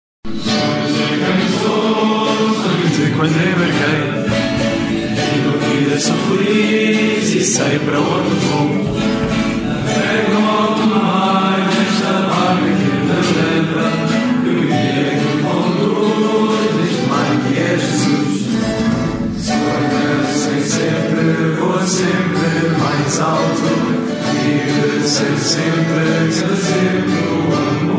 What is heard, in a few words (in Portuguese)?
hino; preSeminario Lisboa